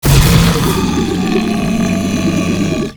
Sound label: Flying Mouth Attack Inhale; Warframe; Man In The Wall